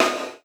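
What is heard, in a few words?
gated snare drum, Drums Acoustic; USB Soundscan vol.10; AKAI; 90s sample CD; GATEKIT 3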